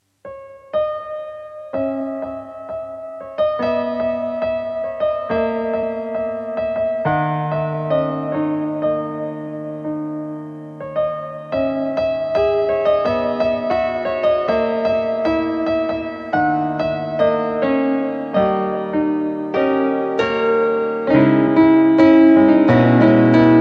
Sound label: piano music, 432 hz; SAMOTNOSC NIE WOLA